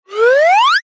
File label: cartoon ascend whistle air; zapsplat